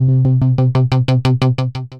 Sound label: Dark Bass Pulse, Tense Pulses; Ableton; Mood Reel